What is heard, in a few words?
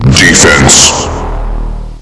power shield